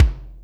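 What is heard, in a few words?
kick Garage, Alesis D4; 300 Drum Machines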